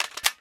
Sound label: grenade launcher drum load, weapons; tf